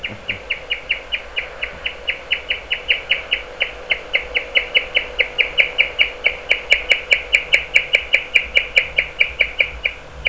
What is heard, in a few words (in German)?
chirpen, meerschweinchen; zwitscher